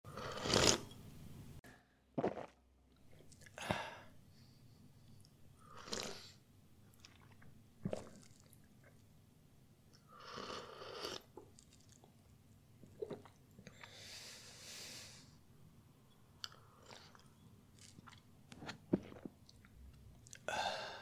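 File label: drinking coffee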